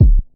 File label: dope bass drum single hit c sharp key